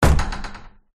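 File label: Break Down Door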